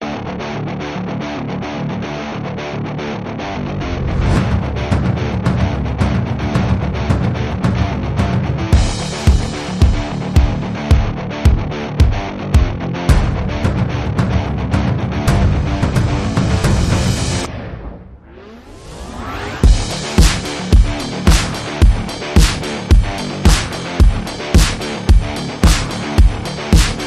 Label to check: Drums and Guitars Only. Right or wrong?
Right